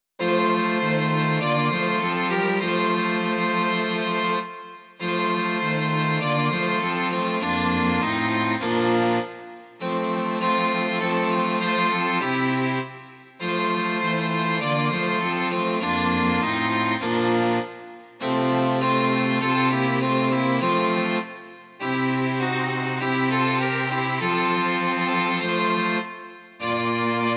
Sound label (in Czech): smyčcové kvarteto; Ó jak věrný přítel Ježíš; Imaginativní verze